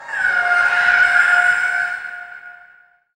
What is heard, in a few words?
FX Dance